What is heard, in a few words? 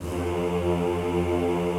F1 R, Classical Choir; Best Service; Extended Classical Choir